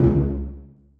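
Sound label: celli pizzicato c2; Sonatina Symphonic Orchestra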